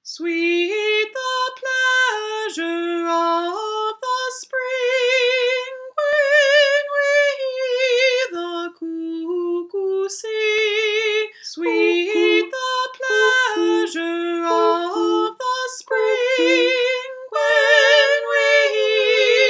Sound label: The Cuckoo